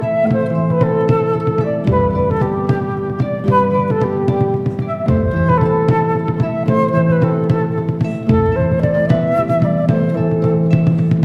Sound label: Harp